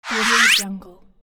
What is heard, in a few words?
whistling transition